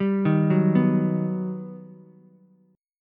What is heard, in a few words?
G Augmented Major 7th, Ukulele